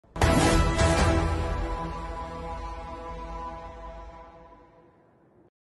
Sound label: ace sound; valorant